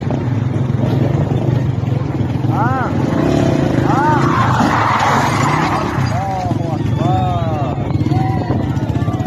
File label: Two Cars Crashing